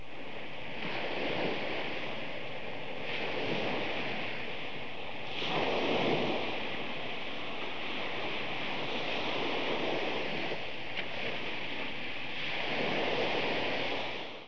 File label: OCEAN; ARMRCMD